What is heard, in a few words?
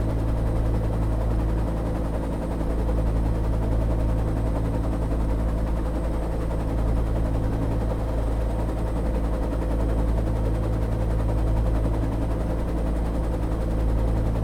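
helicopter interior loop; sons of the forest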